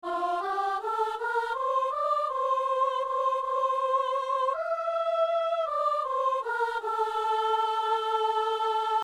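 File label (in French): psaume, ténor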